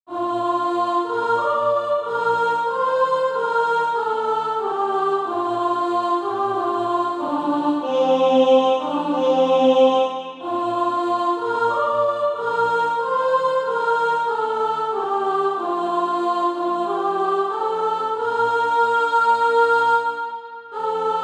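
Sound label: soprano, SATB Practise Music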